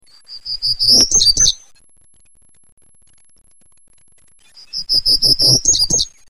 3 or 4 notes similar in pitch, followed by 2 or more high-pitched sibilant notes; Song